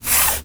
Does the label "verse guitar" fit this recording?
No